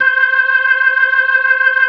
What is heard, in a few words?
C5F, B3